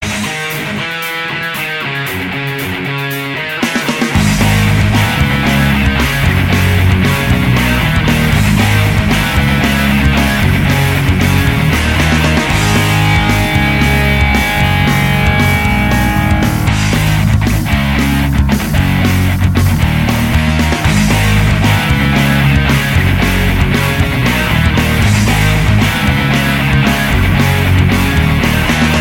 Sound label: Punk Alarm Tone